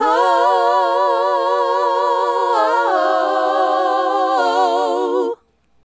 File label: Female Group, Hip-Hop and RnB Vocals